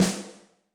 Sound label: Room, Yamaha DTXpress-II snare